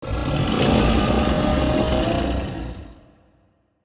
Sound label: kaiju juvenile rage roar; biogenesis